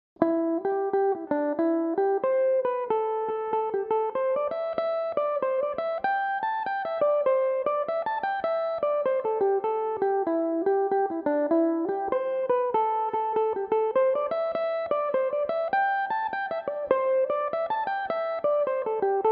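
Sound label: A dorian, irish mandolin, tunes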